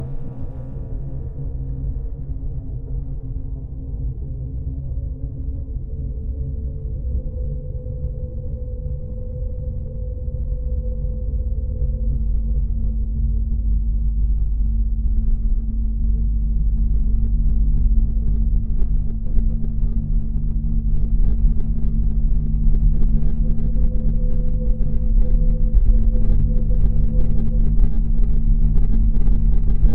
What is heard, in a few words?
ambient space